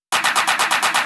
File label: Fill 128 BPM; Vengeance Samples; VEH1 Loops